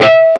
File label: bell; jam; stereo